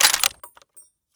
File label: lid open, weapons m249; Urban-Coalition; Anarchy; arccw ue